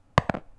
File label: Gamepiece